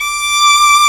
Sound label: VIOLIN D5; STRNGS; AKAI S-Series CD-ROM Sound Library VOL-8